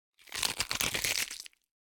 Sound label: Flesh Pry Gristle, Gore; Coll Anderson